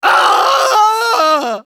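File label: Engineer pain critical death, German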